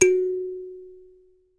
THUMB PI, African Melodic, Old World Instruments; E-MU Producer Series Vol. 7 – Old World Instruments

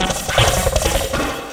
Arp Pan, Keyboards

